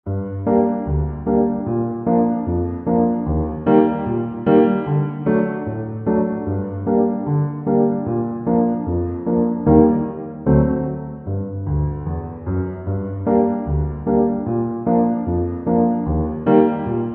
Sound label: PIANO; Minigame2